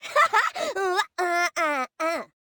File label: Woman Dance Happy; Zomboid; Lifestyle